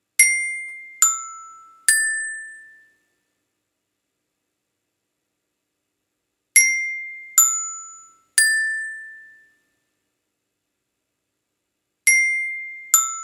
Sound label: xylo, Xylophone